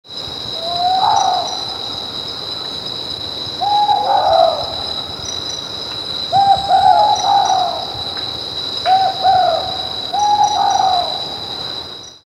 ninoxe ocree, Ninox ochracea

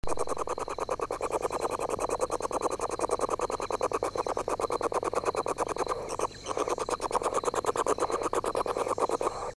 effraie des prairies tyto longimembris

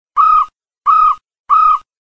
chevêchette de jungle glaucidium radiatum chant nuptial

